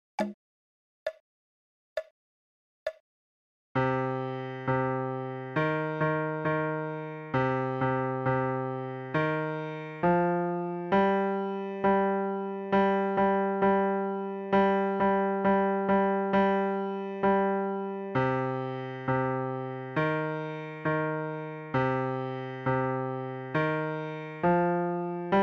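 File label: Drill Ye Tarriers Slo, piano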